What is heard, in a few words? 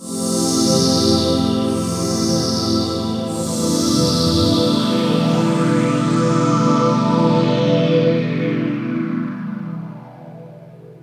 Pad, Melodic Synth